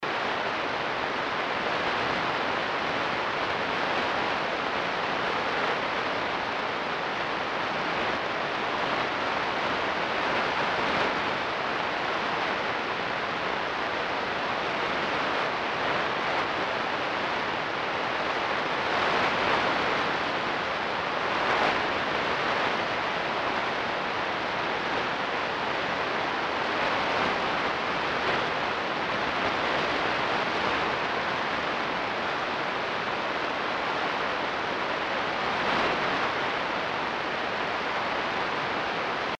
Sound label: 21.1MHz; Ashcraft